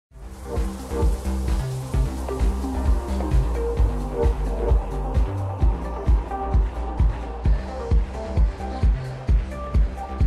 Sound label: custom music track for Billiards room arcade; ICGM Billiards room arcade; governors mansion